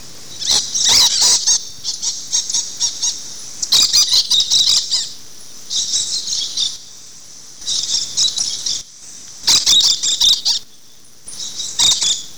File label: hisp conure